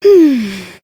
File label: sigh; neopolitan